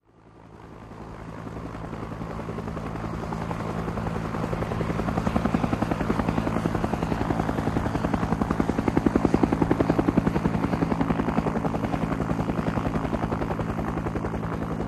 UH-1 Huey: In Flight. Good Prop Definition. Interior / Exterior Use